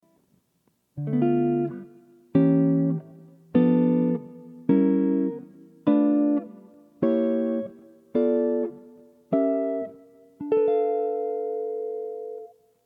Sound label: Min 6th and Dim Chords; Barry Harris harmonization